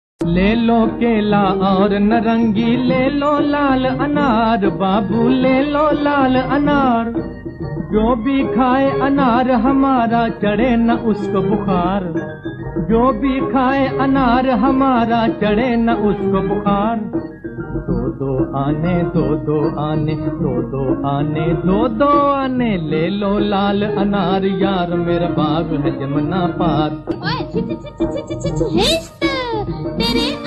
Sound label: Fruitarian Song